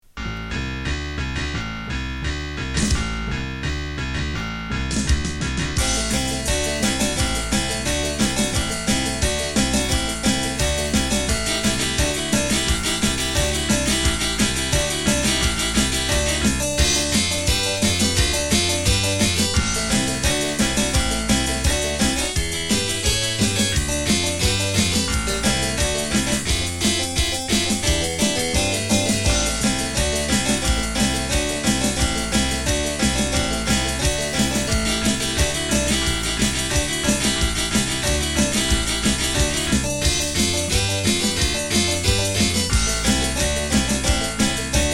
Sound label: Harpsichord